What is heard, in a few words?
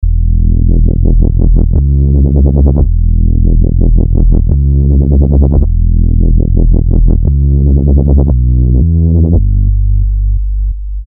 dub